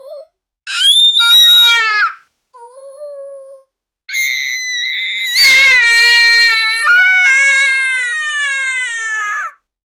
child crying; emotion

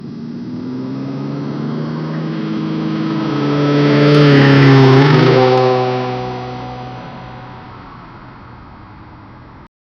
one car aproach and stop

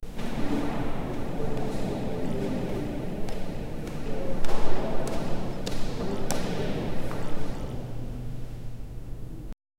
indoor big hall ambience

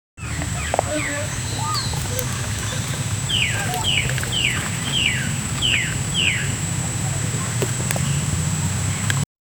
early morning in a villag, village

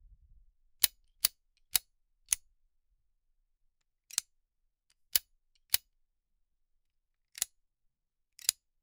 air wrench

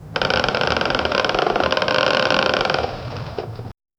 rescue rope creaking unde, helicopter

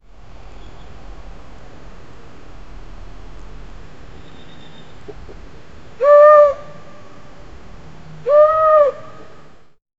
mute swan noises

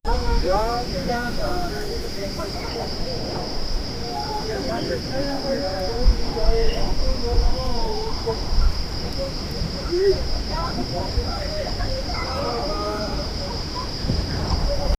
crowd noise mostly male; background ambiance

